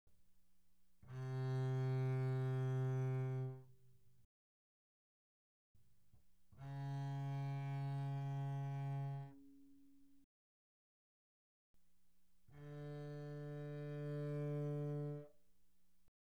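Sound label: Bass arco pianissimo sul G C3 B3, doublebass; Strings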